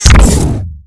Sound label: Fire; Portalgunftw